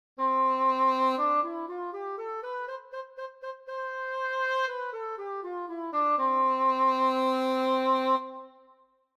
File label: Cor anglais